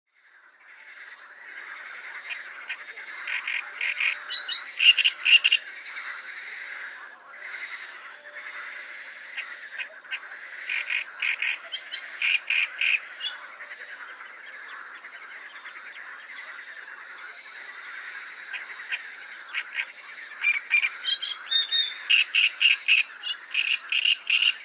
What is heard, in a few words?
great reed warbler acrocephalus arundinaceus